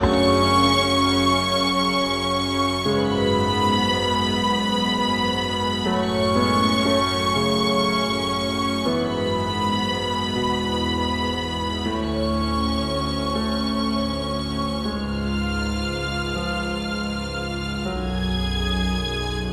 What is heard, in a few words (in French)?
corde; Ordin'ère Mvt 1